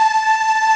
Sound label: Ending loop; SNES; Dracula X (Castlevania V - Vampire's Kiss)